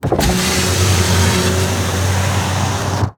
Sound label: Truck GM Envoy Open Window Interior; transportation